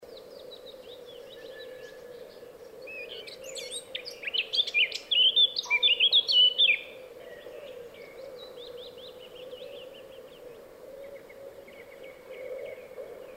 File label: bird